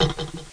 open lock; PC World 2005 July & August

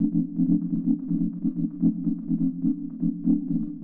SYNTH PAD HOUSE 125 A; eJay; Sphere

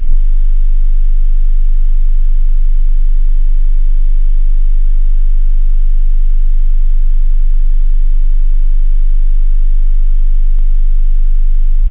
sub base, bass, synth; da capo vol1; instruments

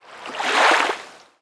water lake

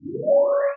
menu; Alpha Centauri

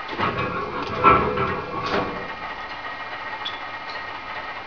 machine clanking; environ; Gunlok

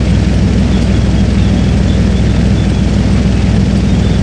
tank; a10cuba

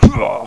impact; QuickShot Demo; QuickShot v1.0

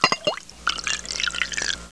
watr pou

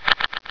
ARCADE

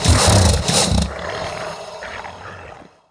sobekite die; DAoC; Toa; Gamestar

